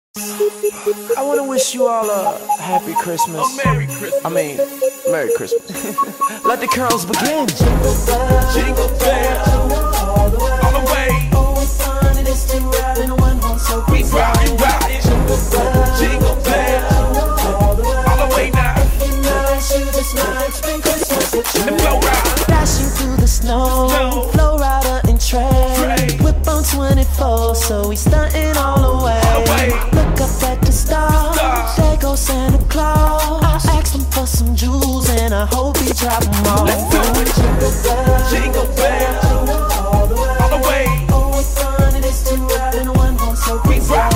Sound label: jingle bells; senior street spalding